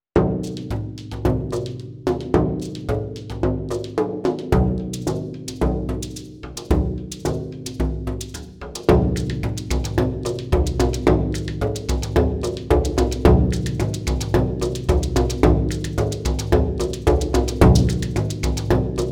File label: Groove; Buffalo Drum RMX